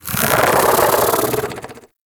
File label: Bug Vocal Alerted; Characters; Warframe; Hunting Critters; InfKDriveBug